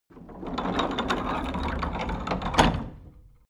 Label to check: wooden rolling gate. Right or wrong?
Right